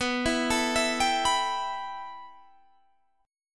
B 7th Suspended 4th Sharp 5th, Guitar, Drop D

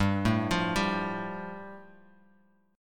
G 7th Suspended 2nd; arpeggiated; JGuitar

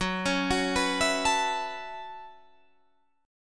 B 7th Suspended 2nd Suspended 4th, chord

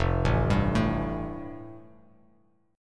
F Major Add 9th, Bass Standard; arpeggiated; 1,0,5,2; JGuitar

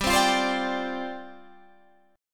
G 7th Suspended 4th G down Guitar Half Step Down

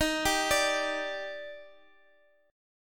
D sharp Major 7th, Guitar, Drop D; x,x,x,8,8,10; chordshape

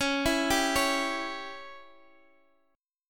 Db Diminished, Guitar Half Step Down

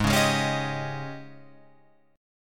Ab Major 7th Suspended 4th Ab Guitar Open F; strummed; 8,8,8,8,10,10; AbM7sus4; JGuitar

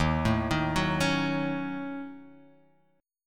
D sharp Minor 7th Flat 5th, Guitar; D#m7b5 arpeggiated; x,x,1,2,3,1,3,x

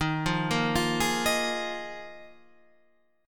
Eb Suspended 2nd Suspended 4th, Guitar, Standard; Ebsus2sus4 arpeggiated; JGuitar

